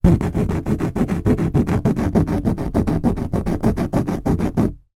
wood hardboard thin scratch rub with blunt object; foley

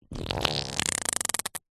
human fart wet dribble poop

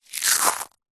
food cereal cornflakes handful squeeze crush

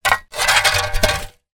shovel drag on soil; industrial

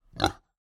animal pig kunekune grunt

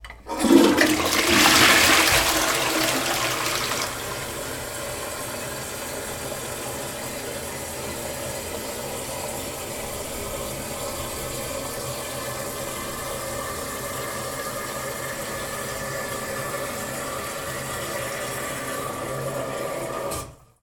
household toilet flushing

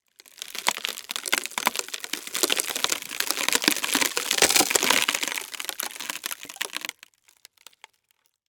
Wood Crack Slow; Blastwave FX

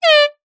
sport air horn 1x blast; zapsplat

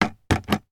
animals cat carrier box door open; sound effects one

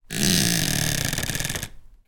household chair seat creak squeak

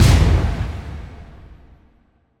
Impact Boom Crash; bottlerocket

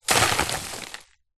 food biscuits crumbs place into trash

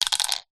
vehicles bicycle plastic wheel reflector fall off

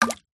foley bottle plastic small empty drop deep water; zapsplat

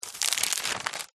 household magazine cheap page turn

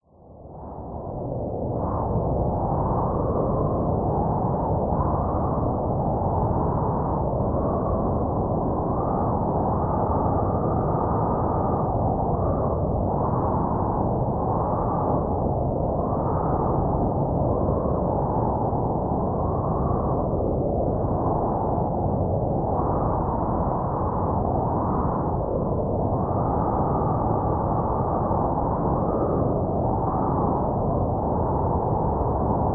nature wind stormy constant gales howling; zapsplat

